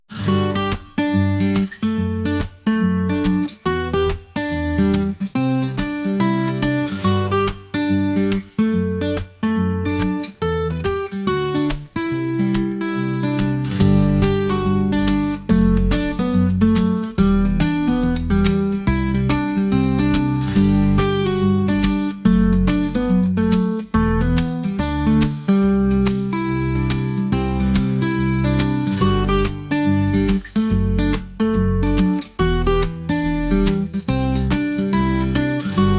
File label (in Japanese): アコースティックギター ほのぼの